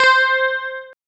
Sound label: GUI, E-Guitar